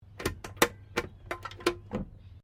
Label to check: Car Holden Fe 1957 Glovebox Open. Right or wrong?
Right